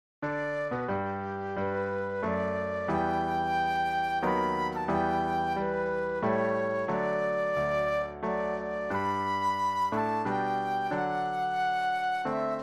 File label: flute solo; patriotic